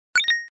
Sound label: Beep Key; bobtherobber2